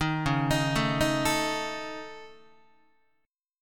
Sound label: C sharp Suspended 2nd Sharp 5th C sharp Guitar; C#sus2#5; arpeggiated; JGuitar